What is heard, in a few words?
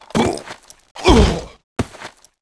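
Soldier die; Kara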